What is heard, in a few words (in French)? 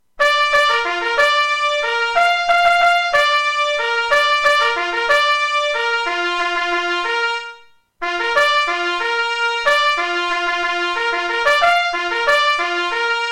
au drapeau sonnerie clairon seul